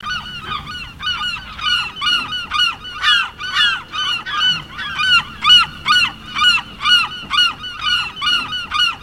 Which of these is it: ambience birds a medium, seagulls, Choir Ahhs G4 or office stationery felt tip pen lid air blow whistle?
seagulls